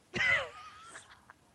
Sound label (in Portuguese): risada